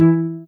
soft hitwhistle; osu

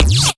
bass c

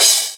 Crash

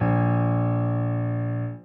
A#2 Bb2, Piano

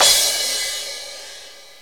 cymbal

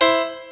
piano

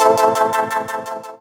Moving Pad 170-A

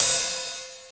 Cymbal

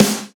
soft hit clap

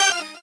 normal hit normal

